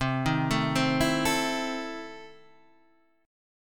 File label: E Major 7th Suspended 4th Sharp 5th, C